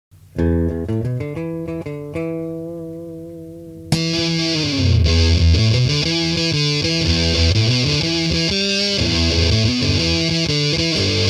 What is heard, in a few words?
Sq Wave; circuit demos